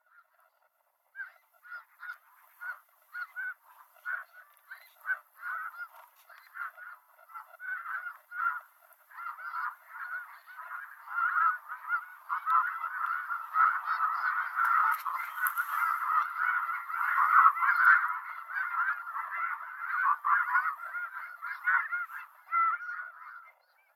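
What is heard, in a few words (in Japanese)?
hakugan, white-fronted goose; ogata; koe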